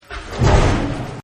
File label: Big Impact; Impacts; Combat